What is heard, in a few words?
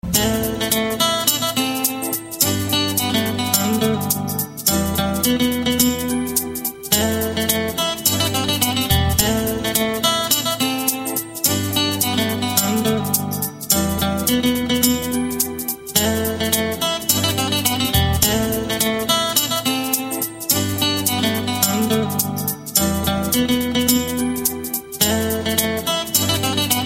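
Guitar Tone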